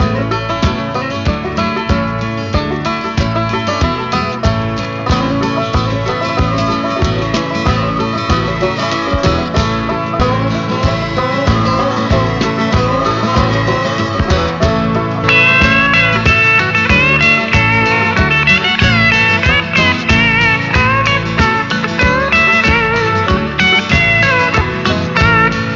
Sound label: Country; NOUGHTS